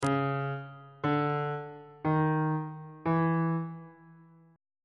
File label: d flat 2nd intervals